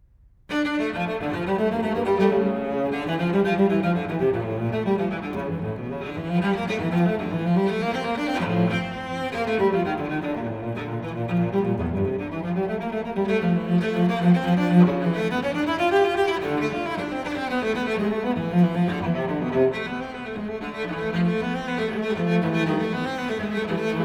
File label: Unaccompanied Cello Suite No. 2 in D minor, BWV 1008 III. Courante; Bach